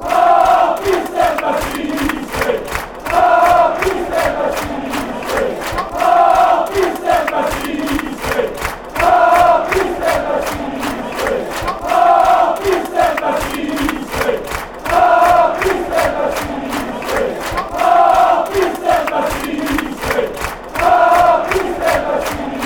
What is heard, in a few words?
newcastle chant